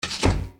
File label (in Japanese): takarabako; Monster girl quest Part1,2,3; Visual Novels